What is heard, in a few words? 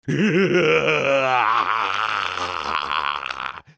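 idiot laughter; smeh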